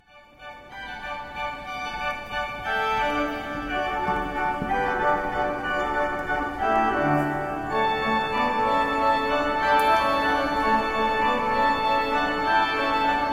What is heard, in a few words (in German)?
pastorella, orgel solo; brixi